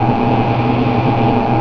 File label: deep terminal; Dystopia; injection